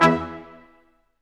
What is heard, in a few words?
BRS Quintet stac; Roland L-CD702; Quint.Stacc